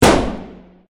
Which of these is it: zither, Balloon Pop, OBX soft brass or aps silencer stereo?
Balloon Pop